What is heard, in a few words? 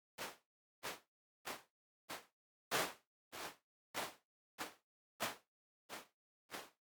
synth beach sand, Physically-informed sonic models; The NIW Project